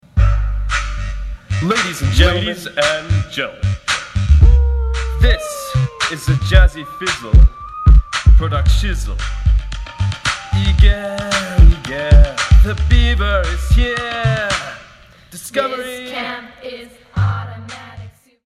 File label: Rap